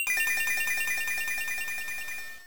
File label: shine; pokerogue